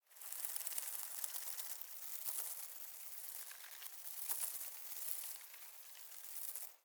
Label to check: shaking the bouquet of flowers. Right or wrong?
Right